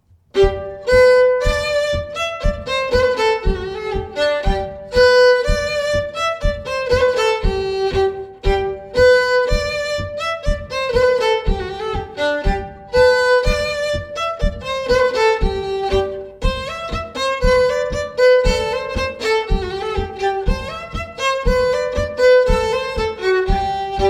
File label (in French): Bourree; Recueil Hainl; Bourree de Rochefort